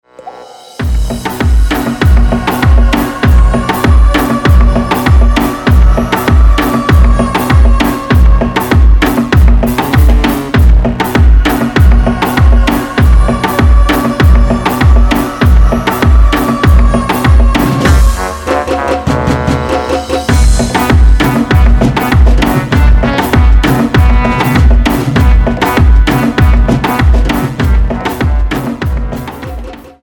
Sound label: SAMBA Samba 49BPM; Extended